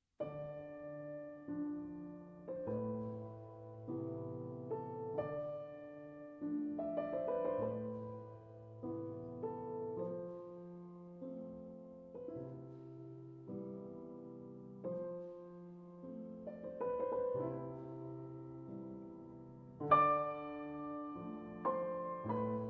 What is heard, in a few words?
Solo Piano; From Amélie